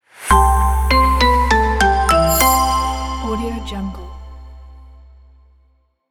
light logo reveal

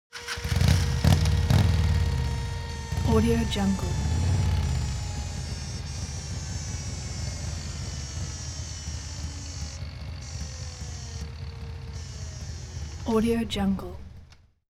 transportation motorcycle harley engine start cry

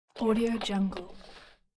creature eating

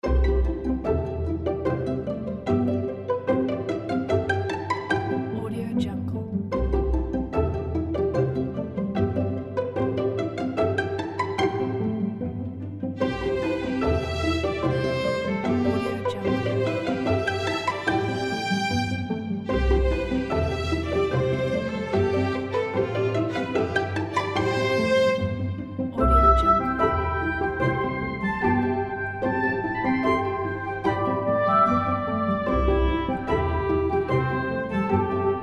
light comedy

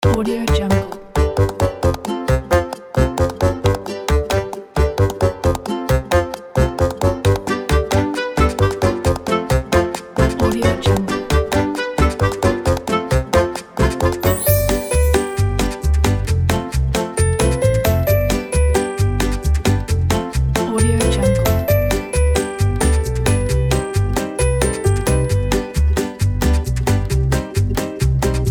funny

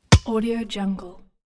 soccer ball bounces off asphalt

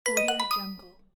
marimba positive

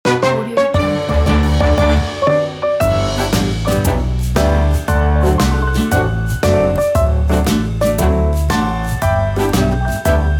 happy birthday, piano

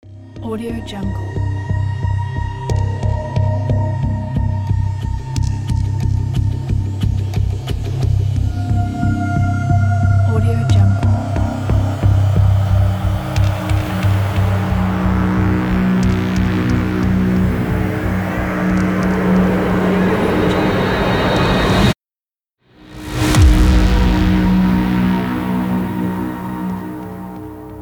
dark logo

